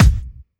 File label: Kick, Drum Hits